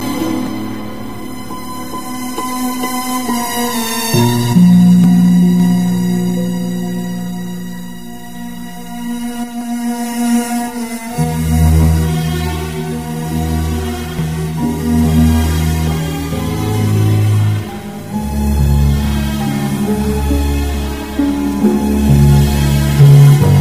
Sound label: Sad Music